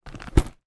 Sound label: lunchbox lay down on side